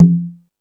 Latin Drums, E-MU Pro-Cussion; drum machine

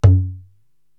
Baya Ghe, Korg TR-Rack

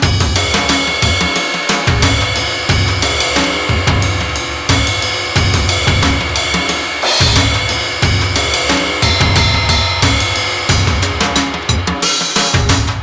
Beat, Drums, Eclectic Electronica

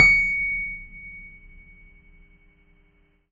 C#7 MP, Piano cp80

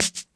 Click Shake Lite, Perc; Lost Tapes From Mars

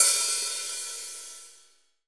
HH, Real Kit; 90 s Sample CDs; Sound & Vision - Gigapack I CD 1 (Roland)

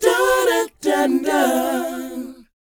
DOWOP G, Vocal R&B DOO WOP; ILIO; Vocal Planet

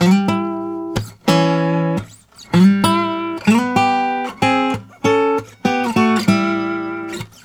Blues Guitar lick; ILIO; Fretworks; 96 HIRUM LICK

